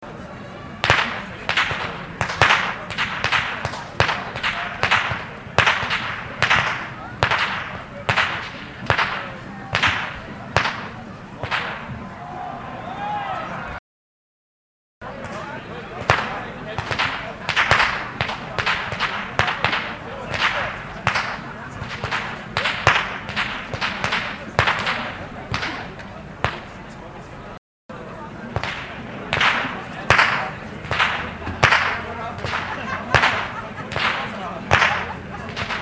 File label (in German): Schnalzen; Ainring; Ainringer Schnalzen